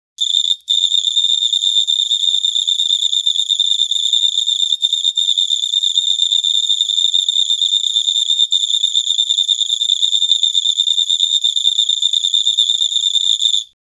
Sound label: electronic beat